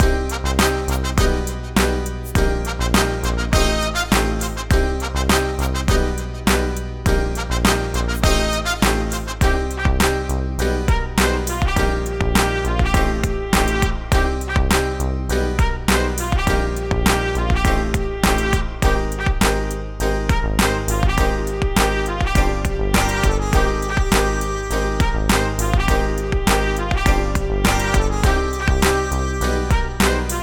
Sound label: Funky Brass Instrumentals; For Podcasts And Quiz Games